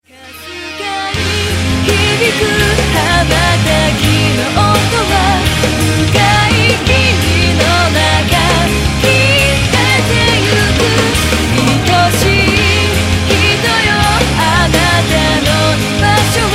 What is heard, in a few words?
Notice